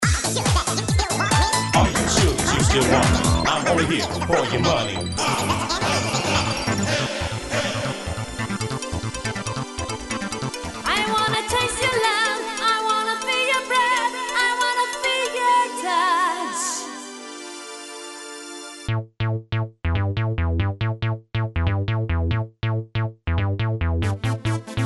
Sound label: TECHNO